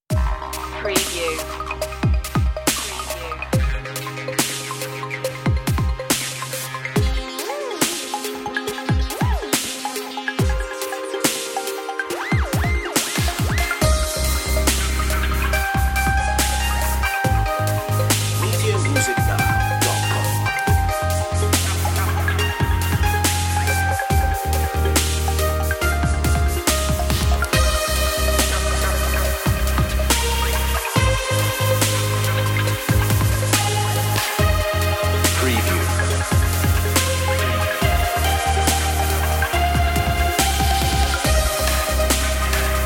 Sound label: emotional dubstep; 60secs edit